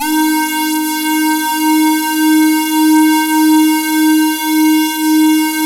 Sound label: SAW, Synth and Organ; InVision Interactive; Keith Emerson; The Most Dangerous Synth and Organ